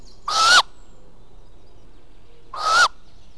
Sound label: great grey yng